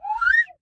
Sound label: Zoom In